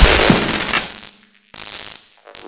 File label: spark valve